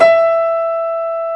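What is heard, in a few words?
reverse E4, piano; AKAI S-Series CD-ROM Sound Library VOL-2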